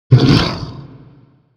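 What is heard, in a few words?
boss damage; monster; grogo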